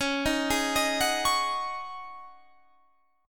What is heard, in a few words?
Db Suspended 2nd Suspended 4th, Guitar, Standard; arpeggiated; JGuitar